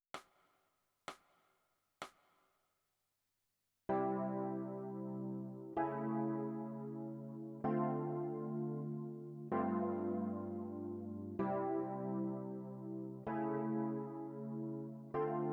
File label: E Piano